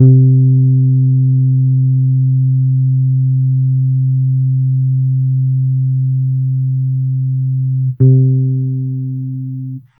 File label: bass fx, electric